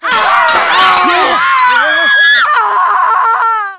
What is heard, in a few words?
panic; Mafie 1 cz